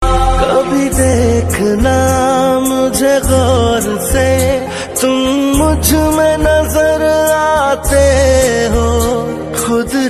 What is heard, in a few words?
new love sad hindi ringtone; bollywood